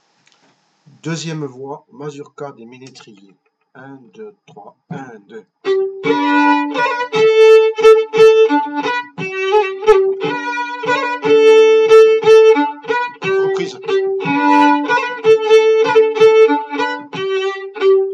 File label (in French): 2ième voix mazurka des ménétriers